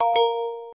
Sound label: Recording Stop; LG L-01B